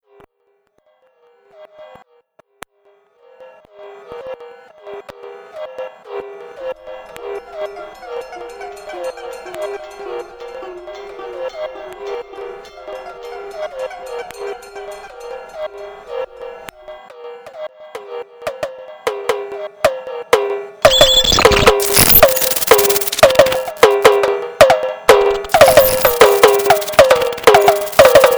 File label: drums outside